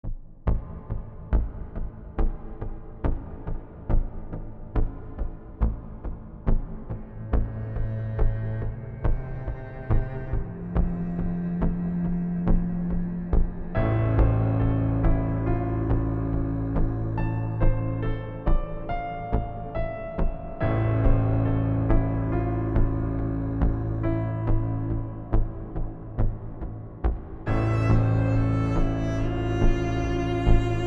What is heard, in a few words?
Ambient/Background Music Instruments: Piano, Cello, Viola, Violin, Voice